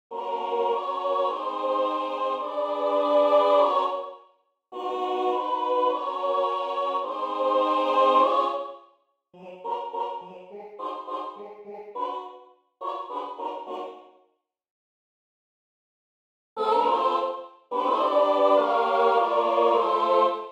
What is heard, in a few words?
vocals